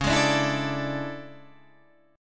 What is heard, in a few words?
A Major 11th, guitar